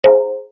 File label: chat notification